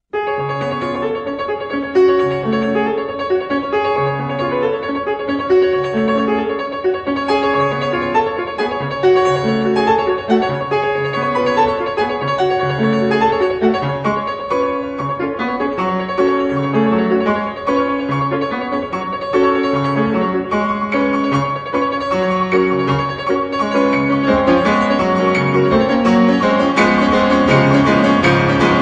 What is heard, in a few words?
sweet piano, ringtones